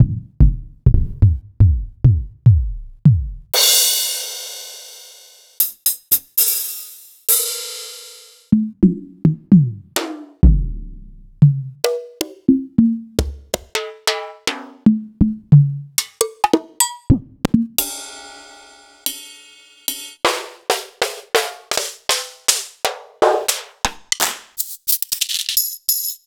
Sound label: Korg S3